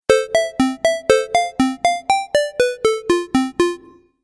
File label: Game App Tune, Video Game Sound Effects